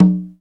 CONGA LO; RAW PERC; Zero-G; Funky Elements

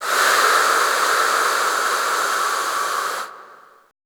VOICELESS, Choir; Best Service; Extended Classical Choir

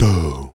Voice Tom; Roland BK-5

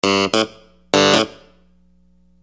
Phunky Clav; Construction Kit; HIP-HOP; Sonic Foundry (Sony Creative Software)

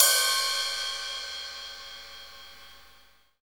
hat rock, Hi-Hats; Roland L-CDX-01; HH Modules

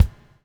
BUBL, BUBBLE; Spectrasonics - Backbeat

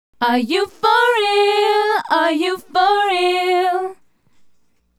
Female Group, Hip-Hop and RnB Vocals

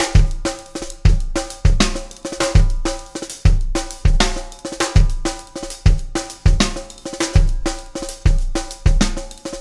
Beat, Loops

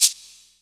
CABASA; USA; Greytsounds; Scott Peers Sound Engineering

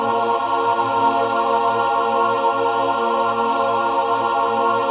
min chord, Symphony of Voices

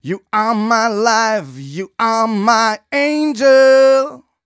m 127 mel a, Vocals

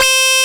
SYNC WAH, Jupiter Synths; E-MU Formula 4000 Series Vol. 3 – Analog Odyssey

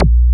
MM BASS, Bass Guitar; AKAI S6000 CD-ROM - Volume 1

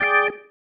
Stab One Shots, Organ

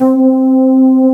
FLUTE PAD C3; E-MU Formula 4000 Series Vol. 3; Analog Odyssey; Synth Builder

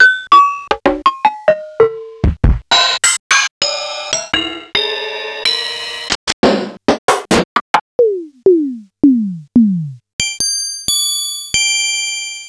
Casio SA-1

